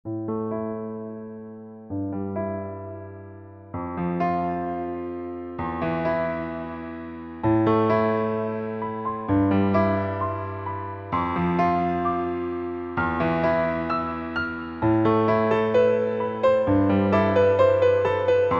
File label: Piano Solo 130